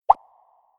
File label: Water Drop